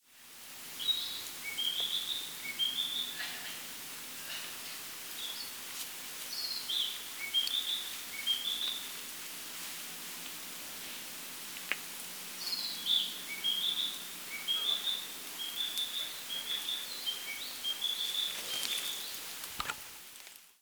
kibitaki, bird song

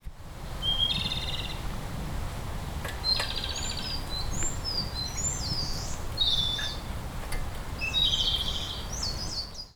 komadori, bird song; コマドリ; さえずり①; ヒタキ科; 新潟県新潟市中央区 鳥屋野潟公園

